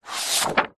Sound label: household book artists sketch pad page slide turn; zapsplat